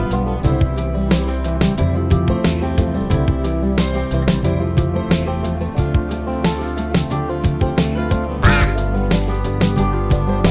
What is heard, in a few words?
karaoke